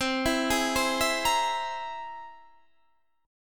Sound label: C Augmented 7th, Guitar, Standard; arpeggiated; 20,19,18,17,17,18; chord; JGuitar